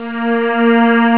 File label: string up; Amiga Soundtracker Sample Packs